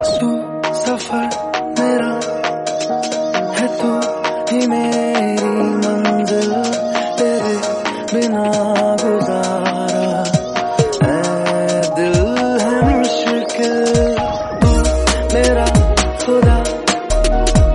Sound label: hindi melody ringtone